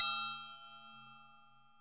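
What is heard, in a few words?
TRIANGLE open, Synclavier Percussion; ILIO; Synclavier Percussion 2; World & Orchestral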